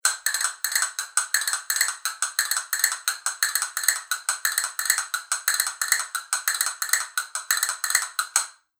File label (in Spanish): granadillo veteado, castañuelas